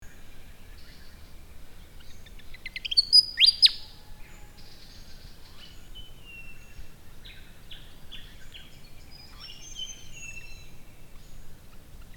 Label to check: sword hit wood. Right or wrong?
Wrong